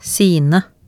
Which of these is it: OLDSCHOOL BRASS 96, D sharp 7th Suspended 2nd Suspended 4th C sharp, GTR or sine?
sine